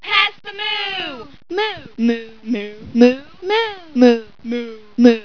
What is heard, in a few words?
moo